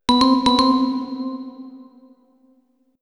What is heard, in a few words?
bell notification; mixkit